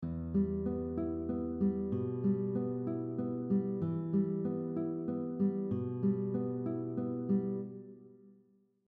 Fingerpicking exercise 2 moving bass notes, guitar; your thumb moves on strings 6, 5, and 4 while your other fingers keep the same pattern